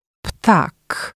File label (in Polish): ptak